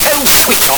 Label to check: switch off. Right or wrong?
Right